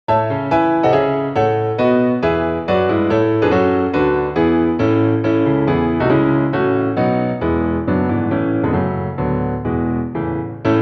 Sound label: piano; schumann; 子供の情景 第６曲「重大な出来事」; シューマン